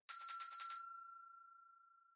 Winning Bell Far Gentle

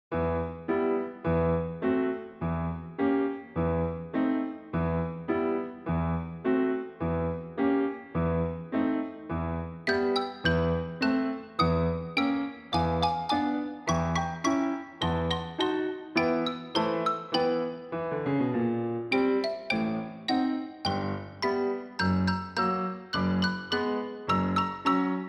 Dance Of The Sugarplum Fairy Slow

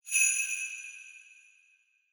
Sleigh Bells Reverb Twist Gentle

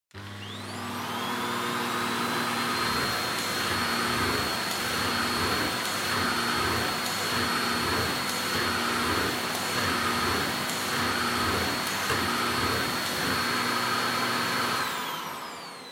Vacuum Cleaner Vacuum Floor Wood High; 掃除機; バキューム 床 木 高

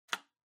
Kitchen Timer Magnet Remove

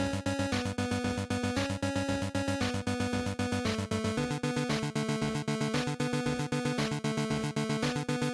GB Action Boss Loop115

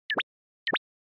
Anime Motion Phrase

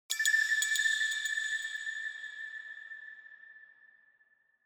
Single Accent Low

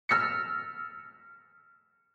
Piano High Reverb

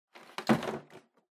TV Hardware Pick Up

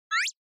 Anime Motion

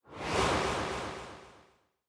Camera Motion, Delay; シーン・カメラモーション

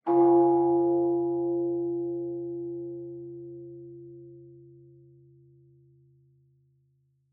Bonsho Far High

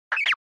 Motion Pop

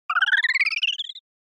Motion Slide Up

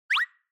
Anime Motion Low

